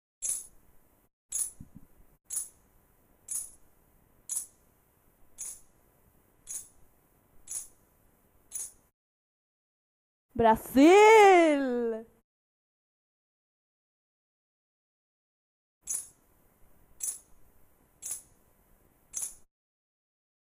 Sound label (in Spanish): Sonajas y voz